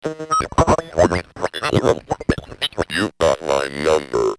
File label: glitch and number